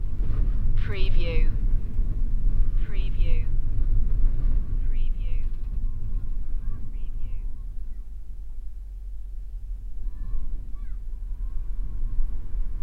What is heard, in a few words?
Wind noise heard inside a motorhome Peak District UK; beanstalk audio